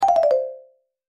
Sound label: Call End; Huawei Ascend G510